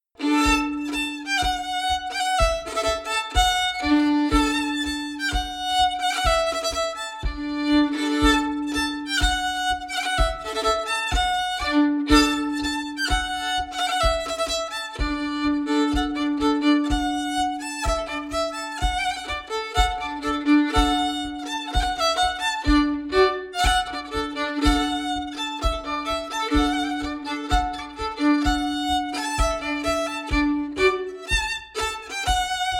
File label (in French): Marche; Allez au pas; Bourrees et autres danses antiques publiees par Elie Dupeyrat